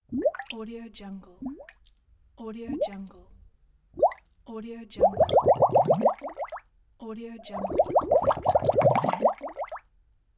bubbles popping; moonaudioproduction

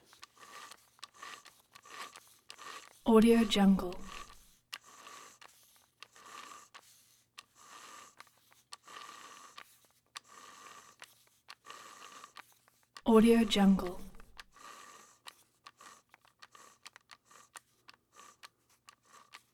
model control rod dial; collanderson

